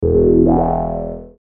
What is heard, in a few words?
Logo Slow Pulse; Blastwave; Sonic Logos